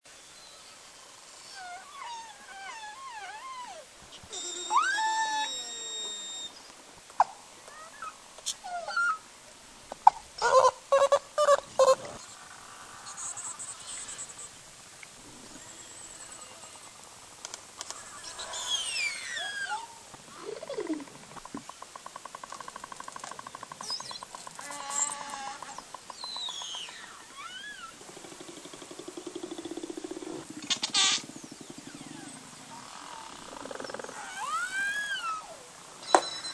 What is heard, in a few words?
belugas